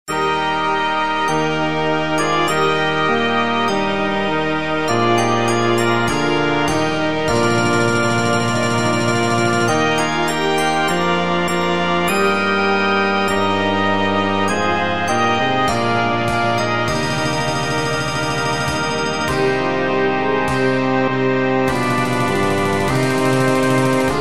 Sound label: brass band, orkiestrę dętą; Alle Jahre wieder – Składanka Świąteczna; Nuty na orkiestrę dętą; boze narodzenie; swiateczne